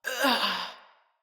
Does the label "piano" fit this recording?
No